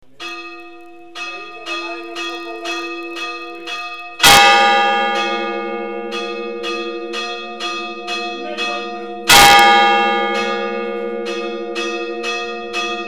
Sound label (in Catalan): campana